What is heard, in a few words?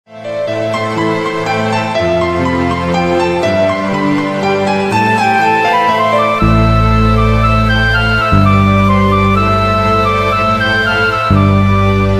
Tamil BGM Ringtone